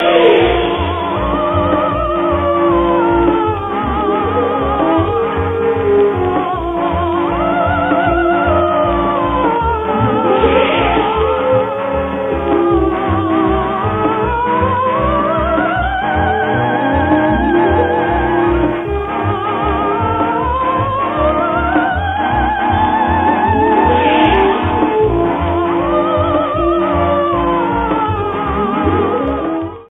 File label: Classic Theme music; Star Trek TOS